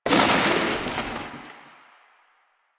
Fabric Chair Destroy; Warframe; Ambience; Entrati

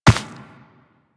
Run Dirt, Footsteps; Warframe; Operator

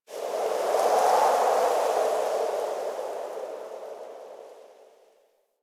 Night Wind Gust Medium; Ambience; One Offs; Warframe; Eidolon Plains

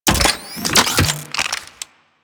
Briefcase Launcher Executive Idle Fidget; Warframe; Weapons; Corpus

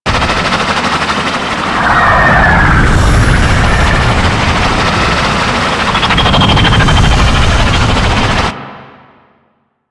Hub Dist Loop, Ambience; Liset; Warframe

